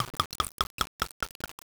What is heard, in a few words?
gulp